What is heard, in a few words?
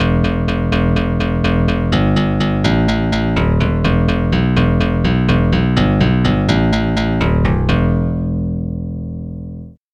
electric piano bass; SpectMorph Evaluation #4